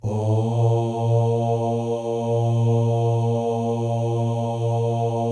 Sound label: male ohs; Spectrasonics; Symphony of Voices